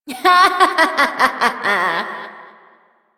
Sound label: Evil Twin Doppelganger Laughter; Warframe; Entrati